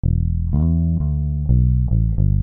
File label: bass